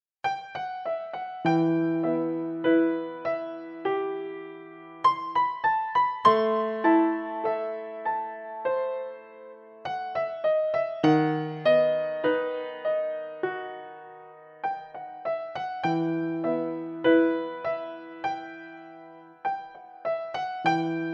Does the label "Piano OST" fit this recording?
Yes